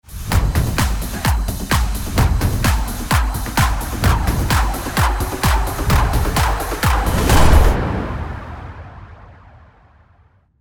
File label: Quiztune